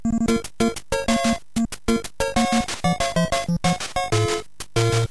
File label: game over